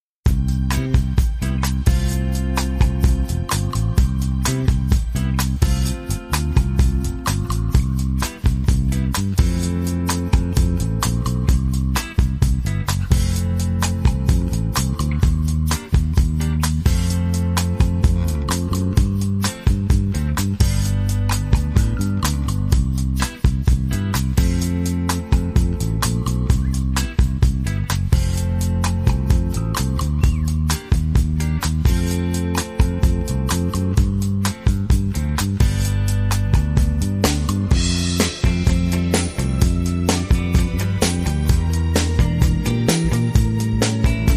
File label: Refreshing Wakeup Call Underscore